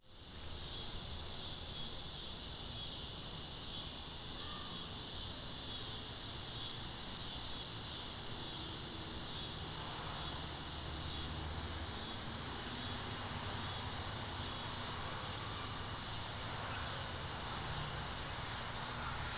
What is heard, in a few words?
Summer Neighborhood, Outdoor Ambience